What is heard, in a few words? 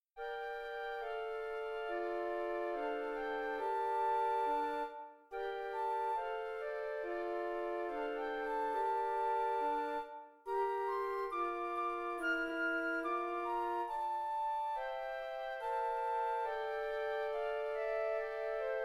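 minuet flute quartet; ravel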